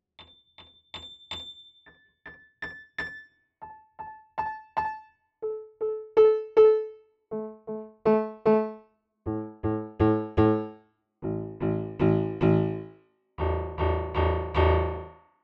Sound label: Streicher Mic Test